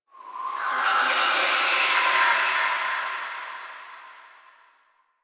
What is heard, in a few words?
Whisper Air Draft; Warframe; Quests; The New War; Ambience; Zariman